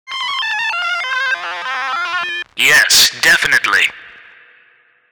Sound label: 8 ball sfx